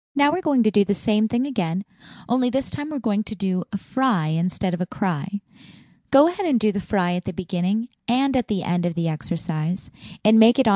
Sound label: Hey Triad Fry